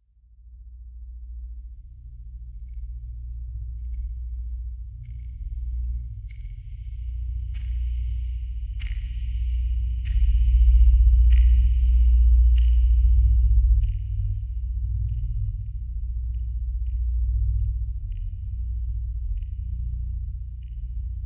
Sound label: arcnoise, water; breathing water; water & silence